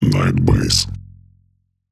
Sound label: Vocal Loop Night Bass 125 Bbm